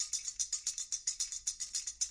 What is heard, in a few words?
tamborine; Western and Latin Percussion; single hits